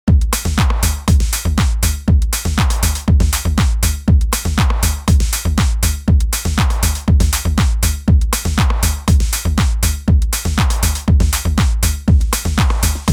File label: Hard House 120